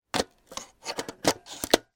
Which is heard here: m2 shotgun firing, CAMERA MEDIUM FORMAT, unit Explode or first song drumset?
CAMERA MEDIUM FORMAT